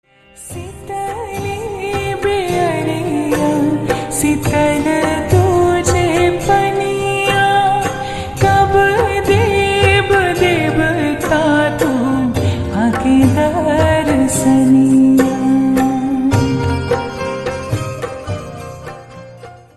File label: Slowed Reverb Ringtone